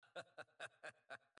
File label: man laugh; baldursgate